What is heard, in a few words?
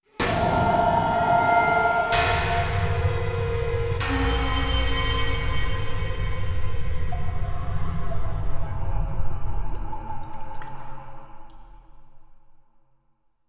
Level Start; Bendy: Lone Wolf; Tunnels